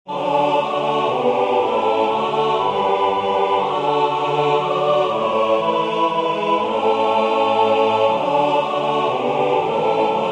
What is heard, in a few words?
Come Holy Ghost SATB